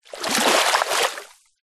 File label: nature water shallow splash creek foot movement